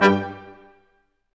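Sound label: Quintet stac, Brass Staccatos; Roland LCDP08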